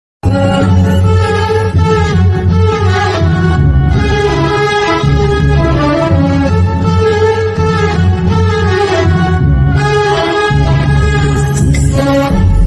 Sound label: ringtone bgm